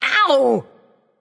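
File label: ouch, female; smite; voicepack; hera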